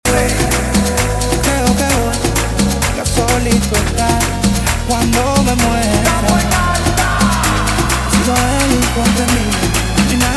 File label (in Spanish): guaracha remix 130 bpm